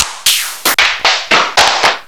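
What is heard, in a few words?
percussion efx; Rubber Chicken Software Sample Bank; OLD SYNTHS; OTHER OLD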